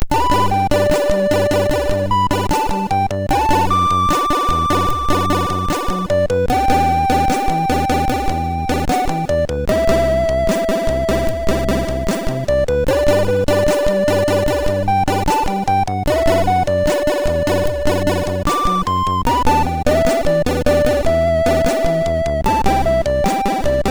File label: sid MOS6581R2; Sunflex; hvsc